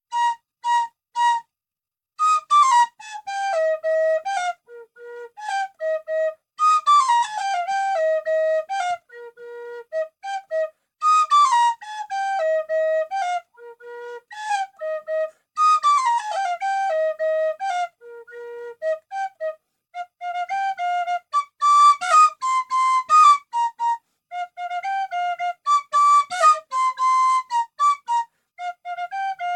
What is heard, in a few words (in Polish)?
Polka na fujarce; Michalowa